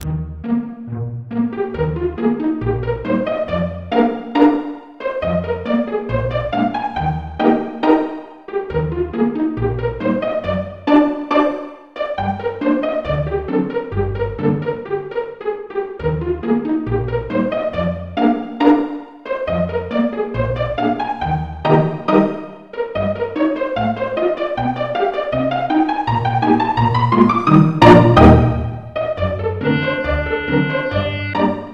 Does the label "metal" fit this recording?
No